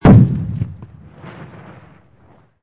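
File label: explosion medium; modern warfare 2; amb elements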